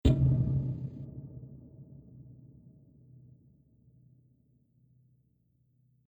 Single Dramatic Hit, impact

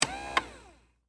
Servo Motor Short, Hospital, Bed; BlastWave

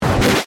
Distortion Wipe

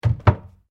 Door Pivot Wooden Cupboard Small Close; Doors; Various doors; Miscellaneous Doors

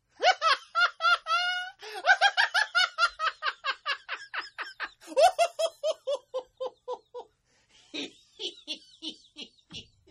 Laugh Male; Laughter; Partners In Rhyme

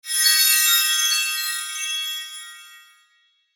Shimmer Chimes Twinkle Reverb; Production Element Imaging Element Accent Transition; Blastwave

